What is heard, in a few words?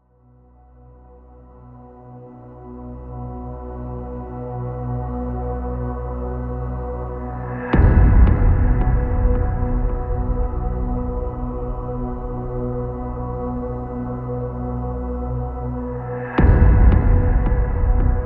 No Piano